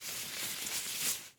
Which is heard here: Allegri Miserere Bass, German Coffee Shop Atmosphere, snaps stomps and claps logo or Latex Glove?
Latex Glove